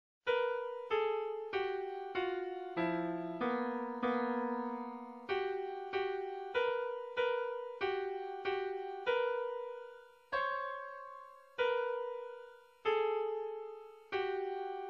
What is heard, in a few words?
Dissonant